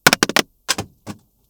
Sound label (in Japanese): もの落とす